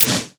ui page scroll; csgo

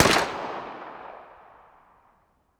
p90 distant

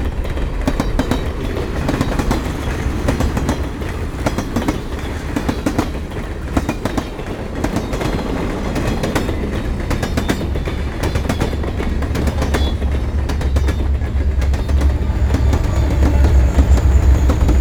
train loop

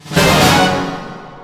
kill

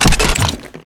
ui crate open; csgo

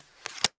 Old sticker apply; cs go

